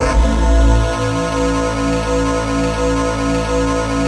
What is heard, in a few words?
synth clav